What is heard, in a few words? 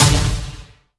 hit; junisaber